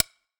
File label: reel Stop; netent